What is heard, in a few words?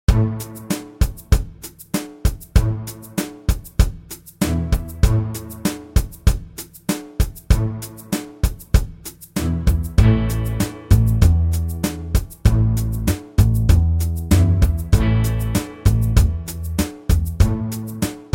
12 Bar, ukulele